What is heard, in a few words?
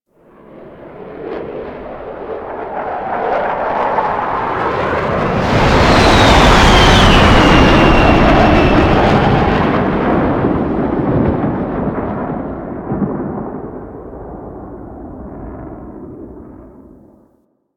Paratrooper Plane Fly Over; Enemies; Soldiers; Warframe; Lasrian